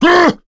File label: Attack; Ricardo; kings raid; en